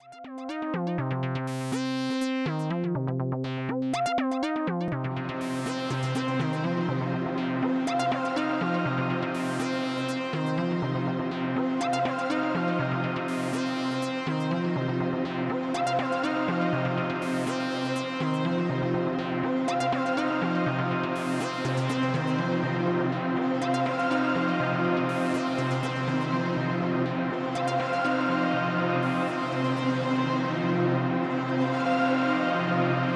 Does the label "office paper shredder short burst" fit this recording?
No